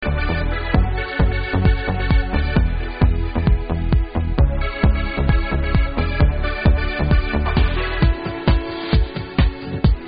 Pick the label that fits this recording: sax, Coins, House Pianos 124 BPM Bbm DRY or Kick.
sax